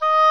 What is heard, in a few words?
Oboe, Orchestral Winds; Roland LCDP04 Orchestral Winds; Oboe 4-8; Oboe f 5